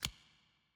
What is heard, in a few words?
button close; endfield